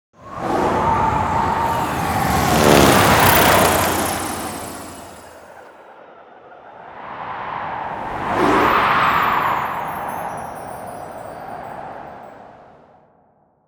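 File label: Ambience Ship Intro; Skylanders: Cloud Patrol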